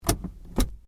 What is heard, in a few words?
Handbrake Off, car; Hyundai Accent 2008; Hyundai hire car; Vehicles